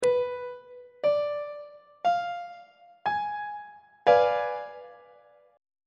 g sharp diminished 7th 1st inversion on treble clef